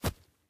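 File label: grass, steps; Terrarum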